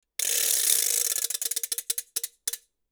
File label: ratchet rit.